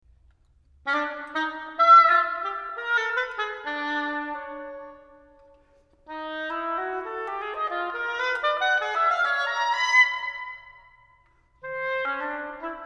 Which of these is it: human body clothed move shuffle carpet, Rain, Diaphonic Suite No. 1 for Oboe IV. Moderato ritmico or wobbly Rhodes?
Diaphonic Suite No. 1 for Oboe IV. Moderato ritmico